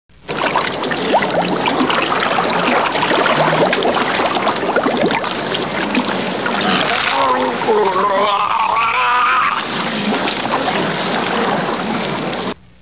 drown; Halloween

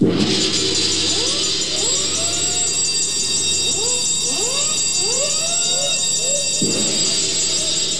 pyl homespin

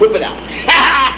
WHIP

